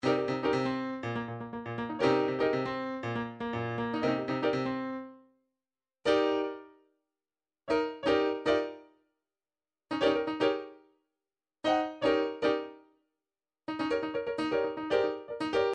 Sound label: midi drums drums funk